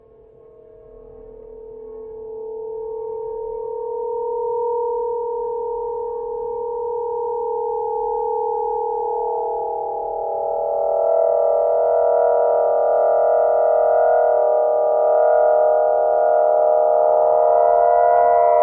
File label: live tramontana harp, aeolian; There are 3 bass strings (Dyneema 2 to 3.5 mm and 2.50 m) and 4 medium-trebble strings (Dyneema 0.5 to 2mm and 1.80m) and some keys to stop; The tramontana harp is inspired from the work on the Quermanco Surealist Wind Organ : we can play it.